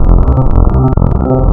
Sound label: VIRUS PULSE, wavetables